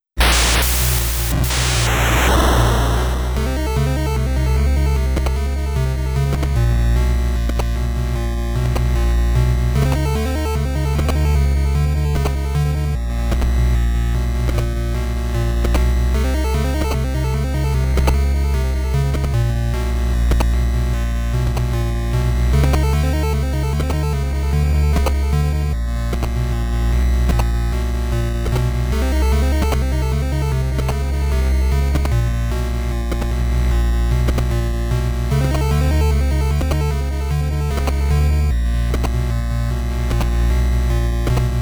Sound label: Approaching Footsteps Lightning; Detective Club; The Girl Who Stands Behind; Final Scene